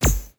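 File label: cashstack symbol fall landed; pork knox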